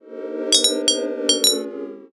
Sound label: tavern